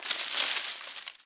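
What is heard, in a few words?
hit leaves; jn4demo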